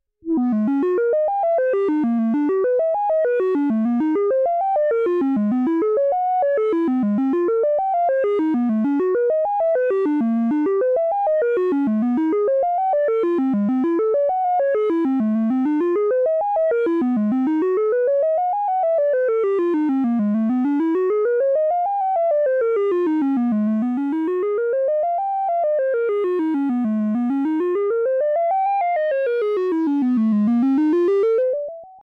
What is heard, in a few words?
lfo random; modular